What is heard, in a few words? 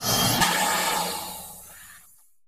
Hydraulic Release, pneumatic